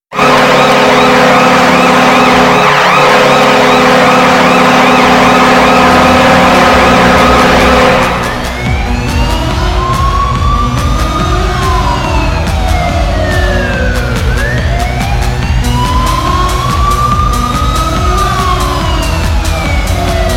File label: Goal Horn; Verizon Center 13 14; Washington Capitals